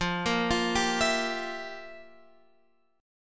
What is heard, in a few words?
F Major 7th Suspended 2nd Suspended 4th Guitar Open F, chord; FM7sus2sus4; x,12,10,12,10,11; arpeggiated